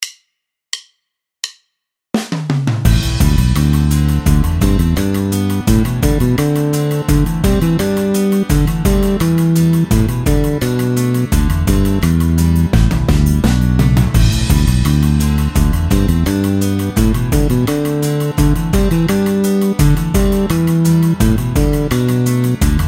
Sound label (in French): hammers